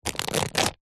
plastic thick packaging rip; zapsplat; foley

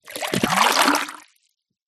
household water pour into plastic jug

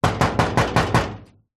impacts metal hollow cabinet old knock

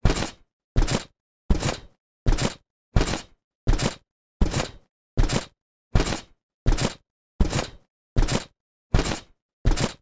sport trampoline bounces many; zapsplat

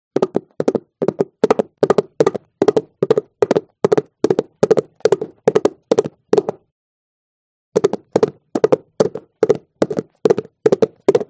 HORSE Hooves Clack Gallop Asphalt; ANIMAL; smartsound

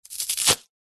polystyrene snap break; foley

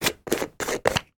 household vitamin tablet container lid unscrew

